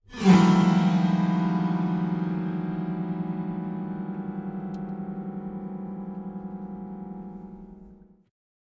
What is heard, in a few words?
Grn Piano Strings Gli, piano